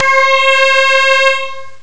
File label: reso long; ST-XX Amiga Soundtracker Sample Packs